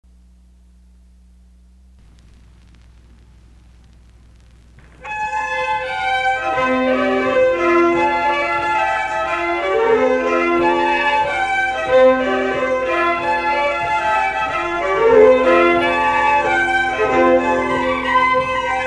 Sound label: Vossarul, Quick, Folk Dance Music